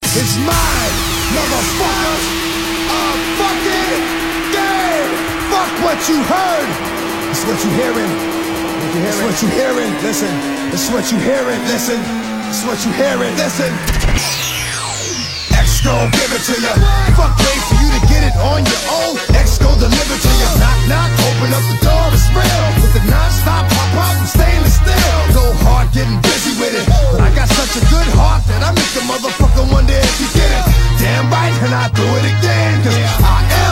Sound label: EDM SESSION